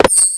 move; ICEHORN

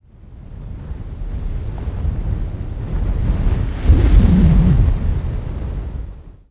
field wind

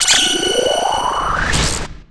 elemental shield

